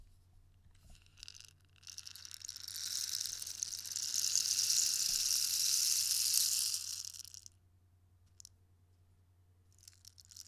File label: Rainstick